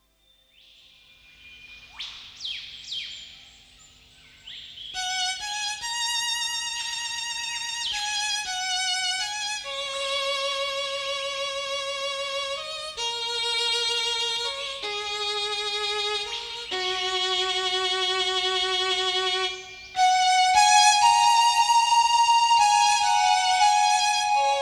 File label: Solo Violin & Pan Flute; instrumental album